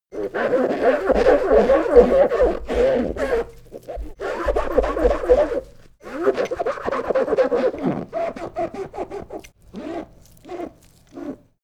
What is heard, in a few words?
sponge squeak fast